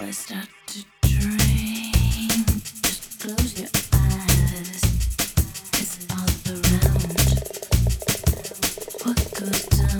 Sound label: Polyfonic signal; Separation of Sound Sources by Convolutive